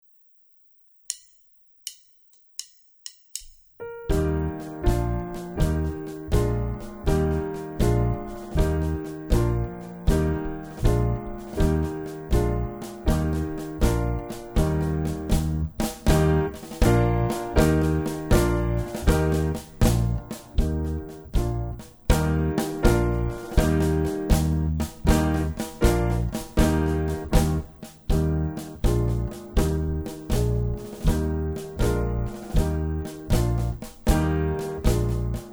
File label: Copy Cat (duet)